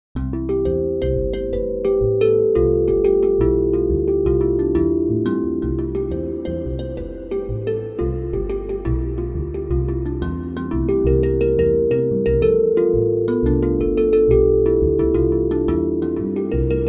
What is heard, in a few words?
tankdrum; steelpans; pianoteq7